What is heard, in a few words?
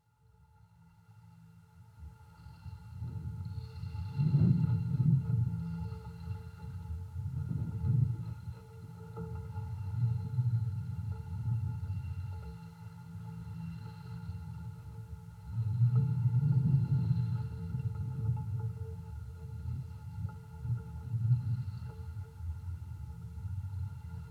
MONO CONTACT MIC SUSPENDED HAND RAIL; COBH